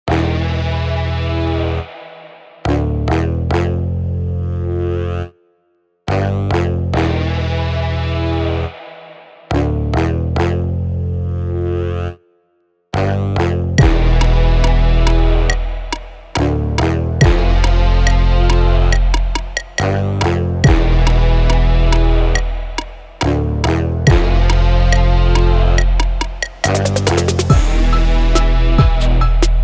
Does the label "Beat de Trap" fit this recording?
Yes